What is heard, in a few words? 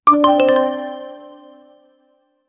a sound of purchasing an, coin